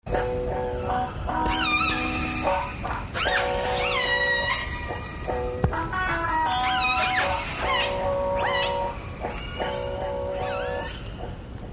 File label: reggae